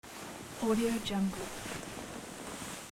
cloth movement foley

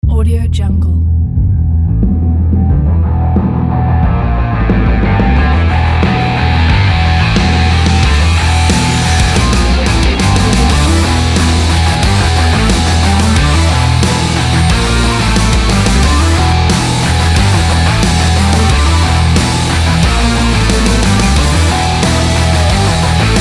extreme sport rock

